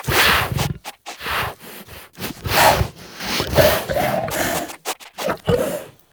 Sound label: Truffle Sniff; Warframe; Infested; Juggernaut; Truffle Hunt